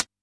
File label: HHCL, Yamaha DD-65, HH